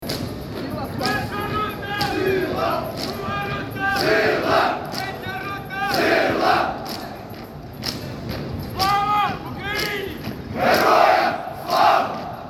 rytm marshu na paradi do dnya nezalezhnosti

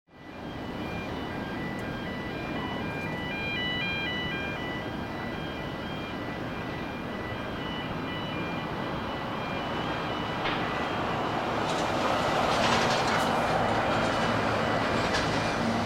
zvuky portu v odesi

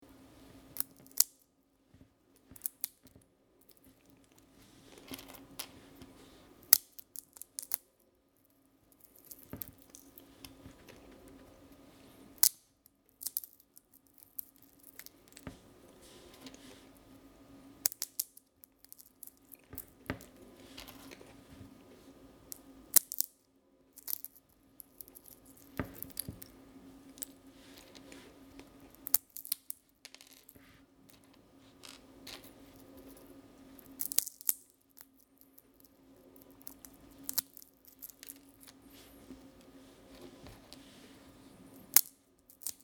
luskannya sonyashnykovogo nasinnya

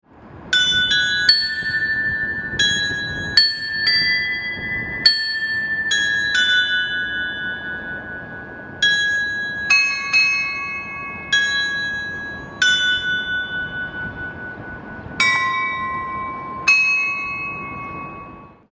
dzvony sofiyi kyyivskoyi

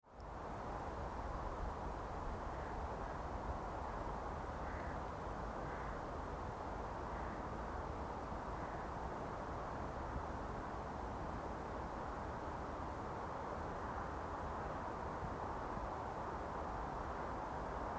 tripotinnya prapora ukrayiny bilya batkivshhyny materi

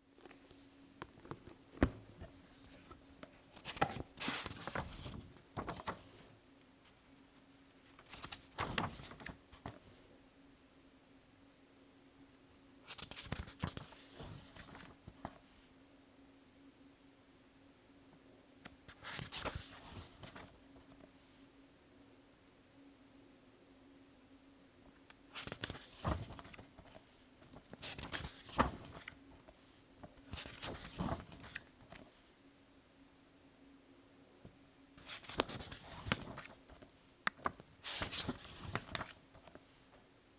knyga

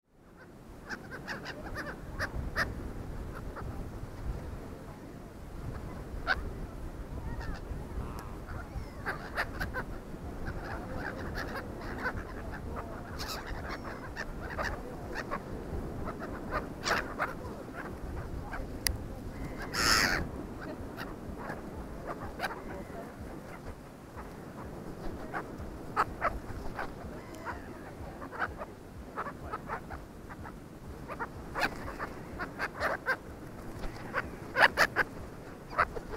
kryky chajok na azovskomu mori

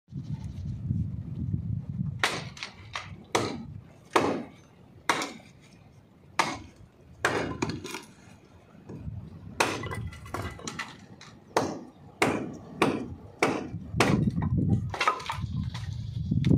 rubannya driv

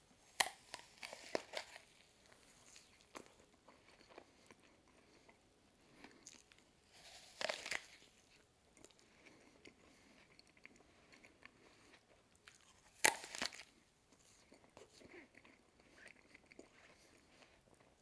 sokovyte nalyvne yabluchko

